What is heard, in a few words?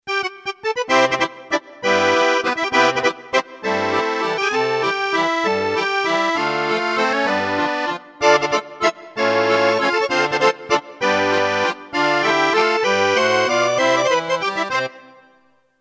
Accordion